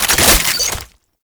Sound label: Archgun Reload; Warframe; Weapons; Archwing; Tn Nokko Archgun